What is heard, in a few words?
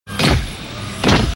robot walk